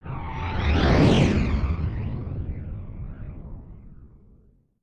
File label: WARP SPEED FLYBY; drones & tones